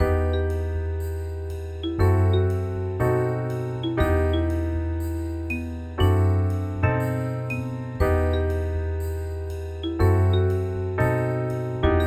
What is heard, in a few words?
smooth jazz